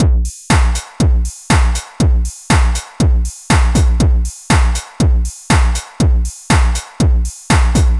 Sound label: 120 trance beat, Drum & Perc Loops